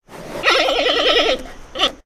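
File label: Pets Bear Panda Ecstatic; creatures; Lego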